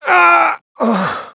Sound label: DYING; BattleSNDS